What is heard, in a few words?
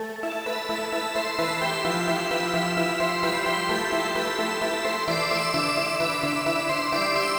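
pluck glitter; fabrik pro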